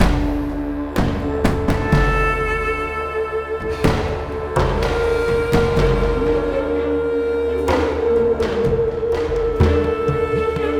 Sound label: Battle Full Loop; The Golden Compass; Samoyed